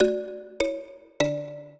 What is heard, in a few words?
minuet, mbira; Mozart